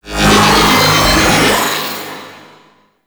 Ground Laser Beam; Enemies; Weapons; Warframe; Tau; Sentient Dactolyst

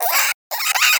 Vocal Alerted; Hunting Critters; Cryptilex

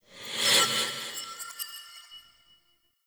Smithy Soul Breath; Ambience; Soul Plane

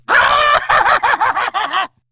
Attack, Battle Barks; Warframe; Grineer Ghoul Sawman

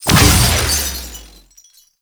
Chain Laser Start; Roathe Summon Catenach; Warframe; Tau

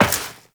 Step Metal Perforated, Footsteps, Walk

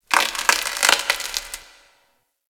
Corpus Infested Glass Crack; Warframe; Ambience

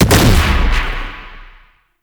Tenet Quanta Alt Fire Explosion; Warframe; Corpus

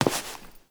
Step; Warframe; Koumei

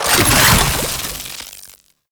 Alchemy Grenade Deposit Electricity; Entrati

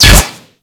Bolt Impact Metal; Warframe; Cross Bolt

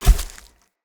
Step Vegetation, Footsteps; Characters; Enemy; Mendicant; MndKing

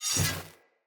Sword Whoosh Accent; Weapons; Swords; Fenn Jotar Sword

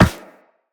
Run Metal, Footsteps; Characters; Operator

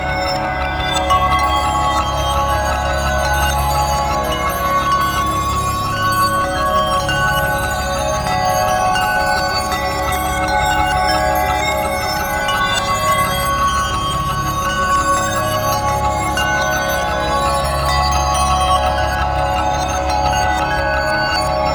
Room Chimes; Gameplay; Mission One; Narmer; Warframe; The New War

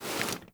Walk Movement Foley; Footsteps

